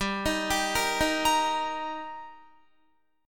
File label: G Minor Sharp 5th G Guitar, chord; Gm#5 arpeggiated; 15,18,17,15,15,17